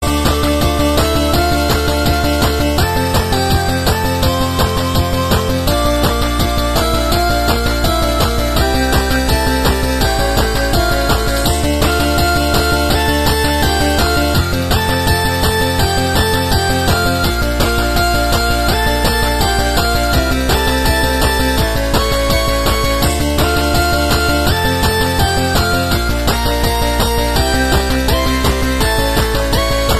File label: 8-bit, ringtones; gravity falls; Disney